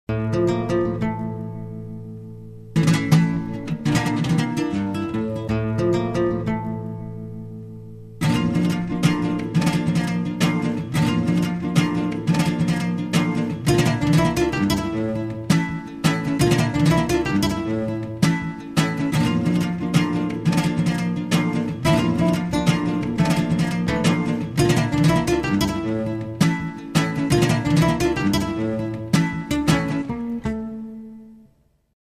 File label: Tango Solo